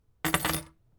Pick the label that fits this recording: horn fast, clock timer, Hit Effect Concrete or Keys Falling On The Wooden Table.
Keys Falling On The Wooden Table